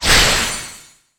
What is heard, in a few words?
skill wand dark scream; FlyForInsanity